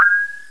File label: pan